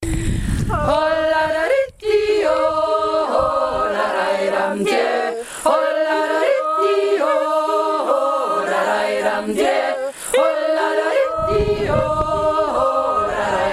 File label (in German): echojodler; admonter